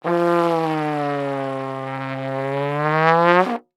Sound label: Cartoon Trombone